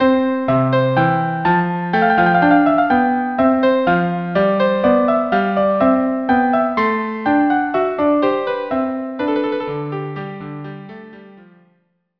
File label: minuet violin viola; bach